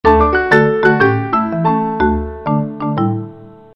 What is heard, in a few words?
Yahoo Im Tone